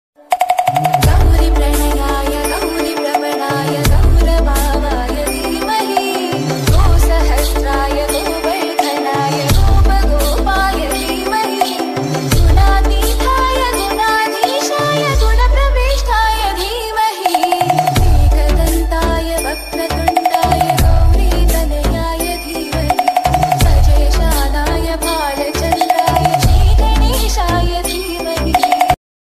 ganesh ji ki ringtone bhakti ringtone hindi ringtone; bhagavan ringtone